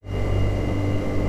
air condition; Dystopia; silo

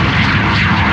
rocket, rpg; weapons; Dystopia

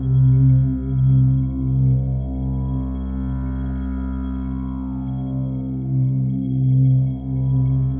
teleporter; Dystopia

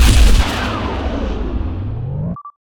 fire, plasma, weapons; Dystopia

